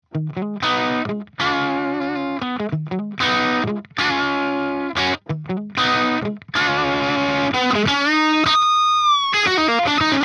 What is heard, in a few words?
Green Pushed; JupiterXTC